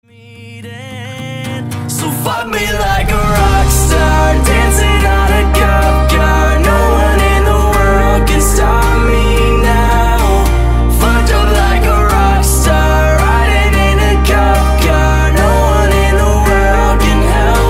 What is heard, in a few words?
Acoustic